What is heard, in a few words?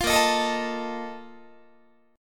A sharp Major 7th Suspended 4th, Guitar; A#M7sus4; strummed; 20,18,18,19,19,20